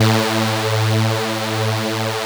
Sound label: PULSA A1, LEAD SYNTH; Best Service Alpha Dance vol.2